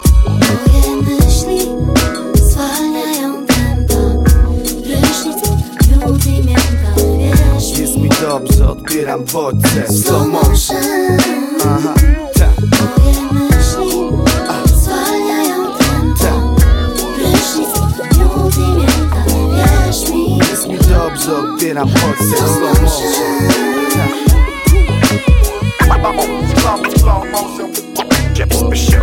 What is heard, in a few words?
slow, ringtones